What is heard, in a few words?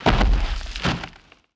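footsteps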